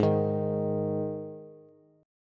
Ab 5th Ab Bass; strummed; JGuitar

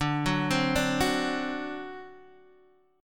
C Suspended 2nd Flat 5th D

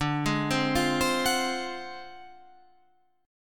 D 7th Sharp 9th Flat 5th D down, chord

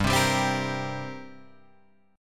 G Minor Double Flat 5th, Guitar, Open G; chordshape; down

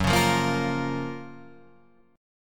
F sharp Major 7th, Guitar, Drop D

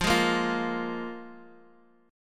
F Minor Double Flat 5th, F, Guitar, Open D; x,x,3,2,1,3; down; chord shape

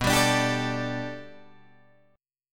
B Minor 7th Sharp 5th B Guitar Drop D, chord

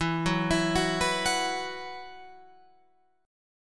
E Suspended 2nd, Guitar Open F; Esus2; arpeggiated; 16,13,16,13,14,13

